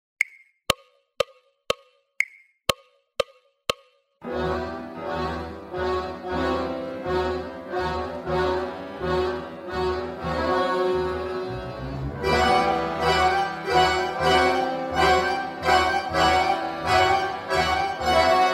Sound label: tango 120bpm; remscheid